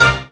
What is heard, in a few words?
hit five; E-MU Formula 4000 Series Vol. 2; Dance Hits